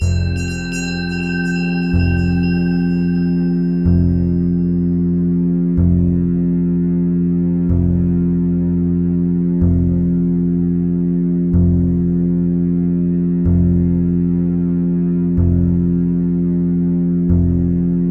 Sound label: Zen Wave Min F; Mike Cirillo; Musical Self-Actualization; Mike Cirillo Method TMSA